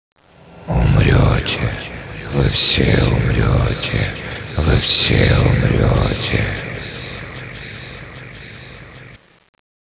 win human

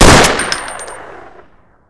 ak47 fire; ak47expert

